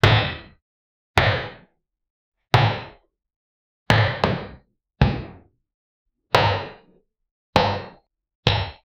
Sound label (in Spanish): pesos de academia